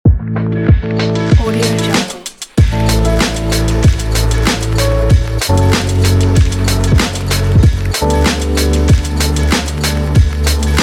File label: chilled trip hop